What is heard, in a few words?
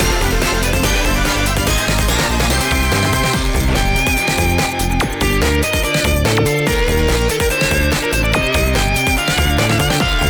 Jingle Win loop 144; Arcana Heart 3